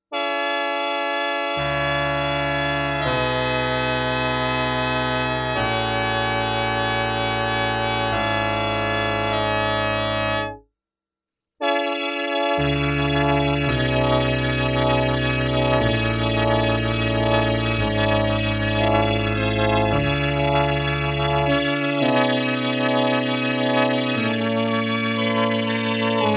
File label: Tremolo